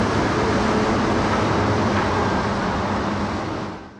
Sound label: atomic turbine exterior ending